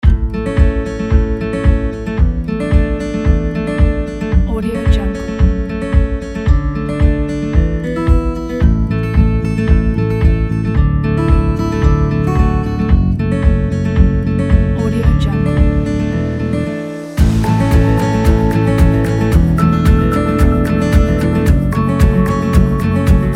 real estate

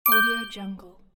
bells notification

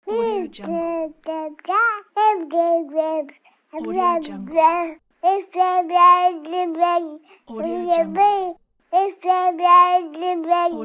baby talk mega pack

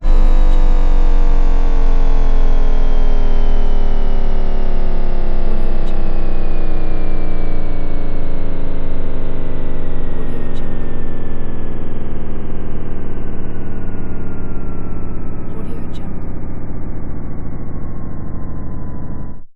engine power down

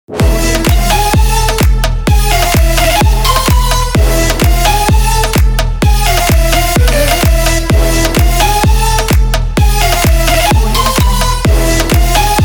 egyptian edm ident; shinybirds

